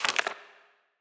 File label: Menu SFX Achievement Book Page